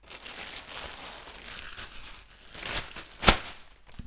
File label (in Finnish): muovipussi